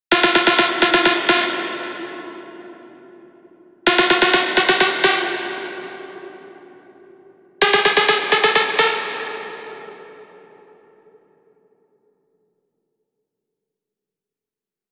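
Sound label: 128BPM Drop Lead wet NoSC, Synth, F minor; Vengeance Essential Clubsounds Vol.5; VEC5 Demo Constr Kits; Mainstage Kit; Root Fmin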